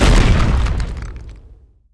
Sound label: DROP Large